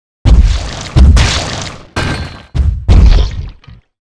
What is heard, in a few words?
Die; Seer; GamigoNA